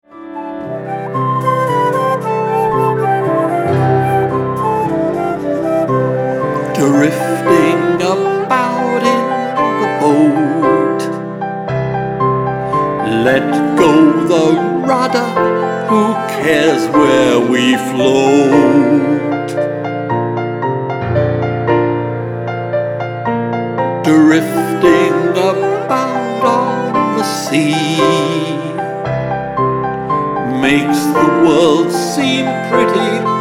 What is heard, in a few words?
Barcarolle